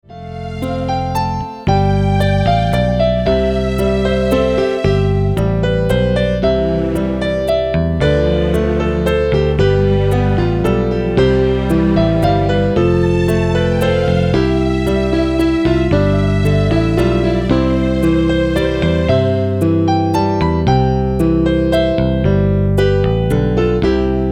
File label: waltz